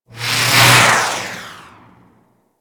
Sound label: Beam Gun Loop One Off 3D D, weapons; Player Path Beam; Fomorian